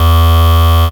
E Talk Bass

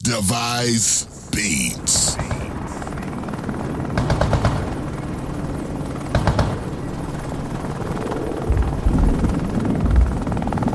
Breaks

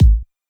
Kick

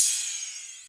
hihat open smooth

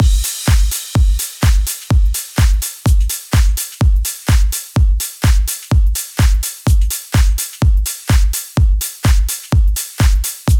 DRUMS 126 A

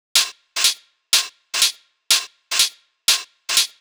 123 hat

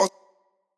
Vocal Glitch

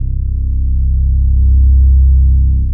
Reese, Clean

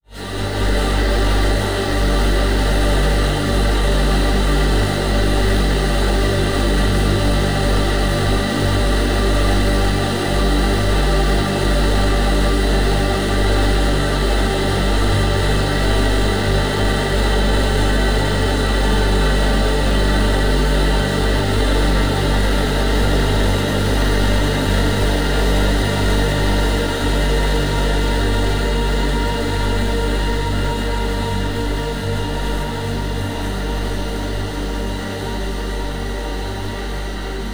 pads Gm; Phantom

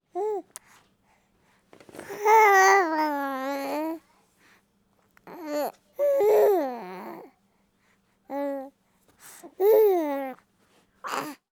baby talk pleasant

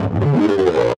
A- Bass Hit

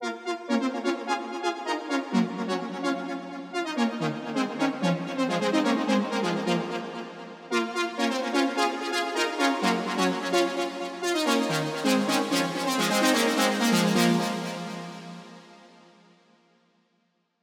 Breakdown Lead 128 A

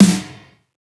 NEON SNARE

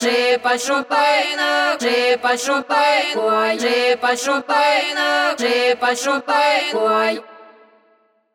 Loop 134 Wet Tail

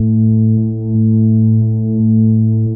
Reese Clean

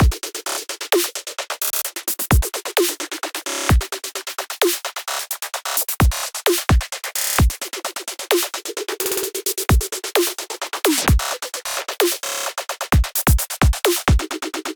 130 glitch drum loop; punchme

